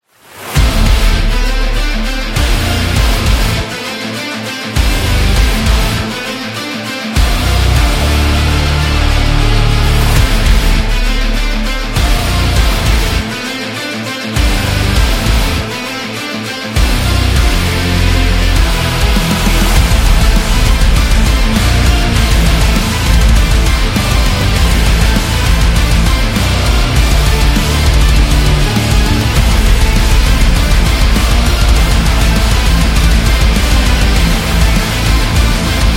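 Epic Metal, Powerful metal background music built on heavy guitars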